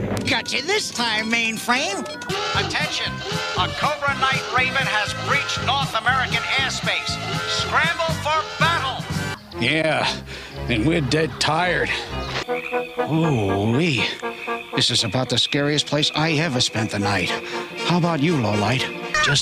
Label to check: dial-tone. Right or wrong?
Right